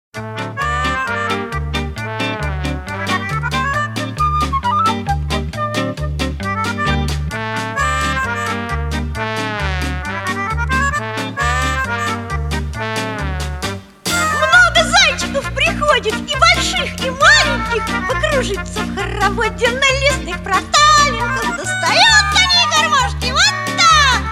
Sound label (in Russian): pesenka s garmoshkoy